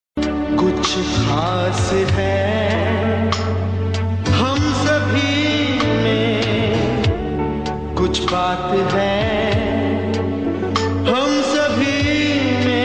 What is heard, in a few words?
Song; Dairy Milk